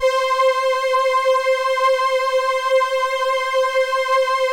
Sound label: LAMBDA, String Machines